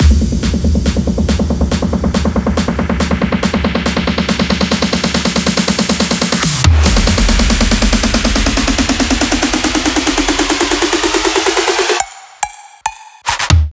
BUILDUP MEDIUM 140, modern techno; teknovault modern techno production suite

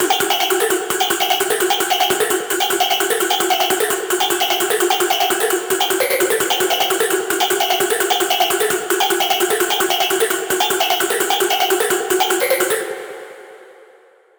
PL Tickx, hard techno; teknovault hard techno vital presets vol 2

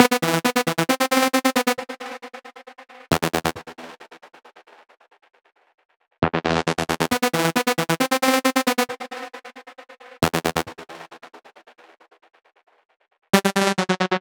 LEAD 135; modern techno; teknovault modern techno production suite

